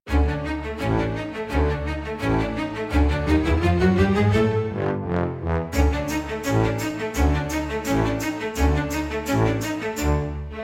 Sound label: Tuba Tumble Run, comical orchestra for slapstick gag scene